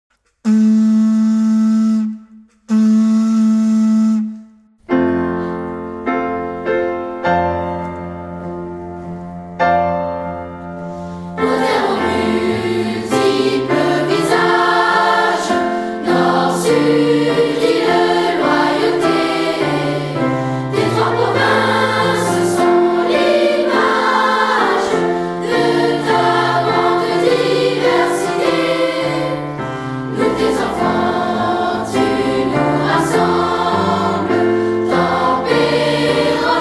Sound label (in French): Hymne, Choeur d'enfants à deux voix